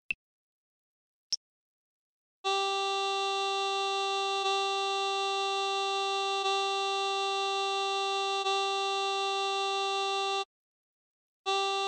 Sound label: g harmonica